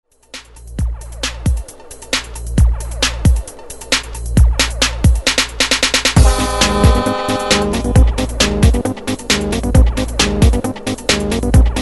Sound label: Hype Re Drum 134 Bpm; DJDOLLS